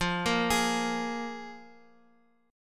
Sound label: F Minor Double Flat 5th, F, Guitar, Open F; Fmbb5 arpeggiated; x,x,x,0,1,3